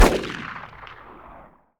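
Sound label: glock exterior shot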